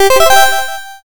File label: start